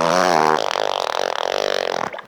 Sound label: fart shitty at end